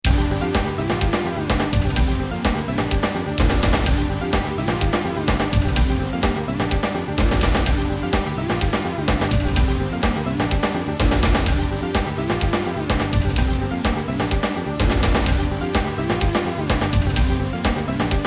music victory; iron man

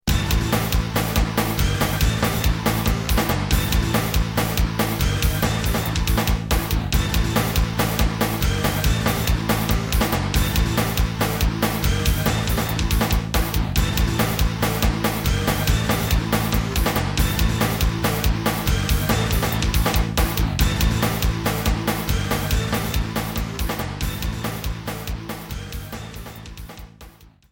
music victory; shuma-gorath

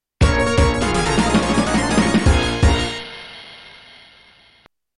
Music Vs

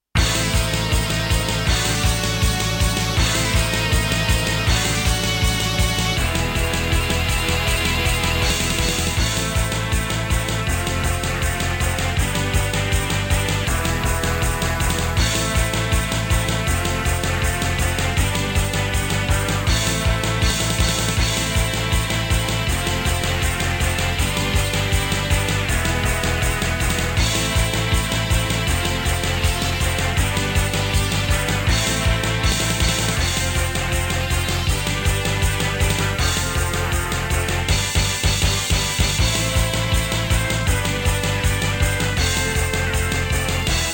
music onslaught

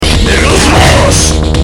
smash; aof2

